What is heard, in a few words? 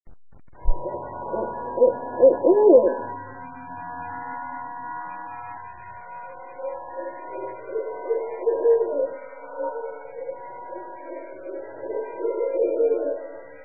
owls